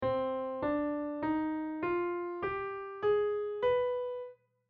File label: C Hm Scale